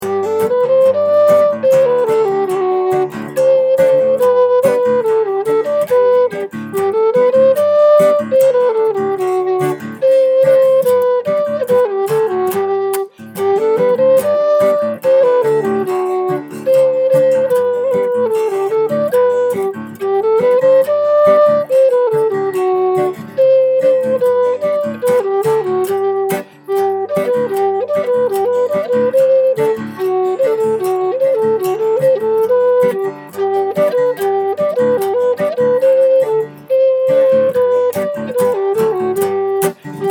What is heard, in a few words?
polka lo bondolau